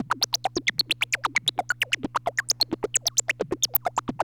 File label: TEK NOISE, SYNTH SEQ